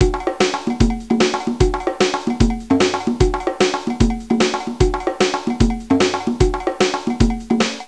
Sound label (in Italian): suonerie musica ritmica